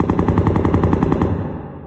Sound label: inside battle, prison; ambient; source engine; hl2; video games